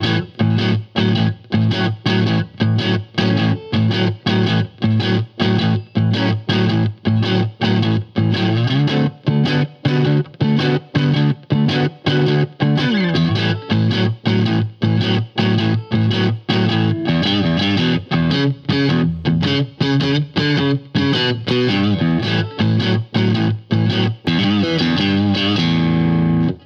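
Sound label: Blues Guitar; B+C Sect; Digidesign; Pro Tools Essential Loops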